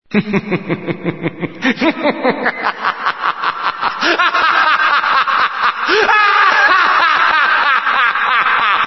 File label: evil laugh